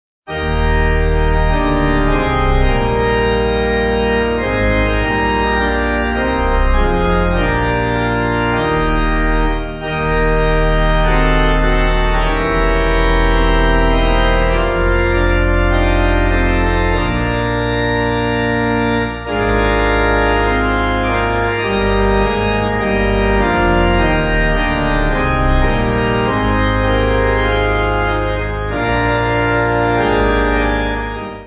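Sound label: Pipe Organ, Music Backing Files for Churches; Strength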